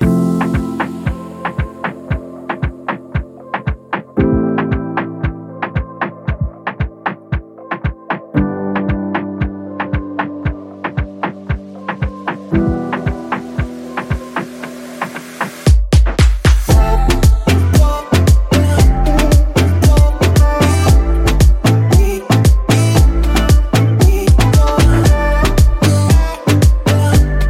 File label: beat